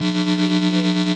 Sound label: Electric Buzz Pulse